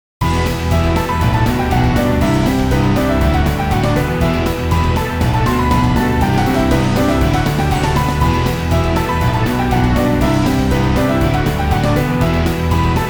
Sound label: piano